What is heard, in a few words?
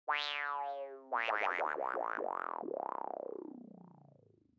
that awkward moment darkness sfx; that awkward moment darkness ova